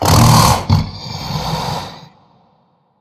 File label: growl; smite; god sobek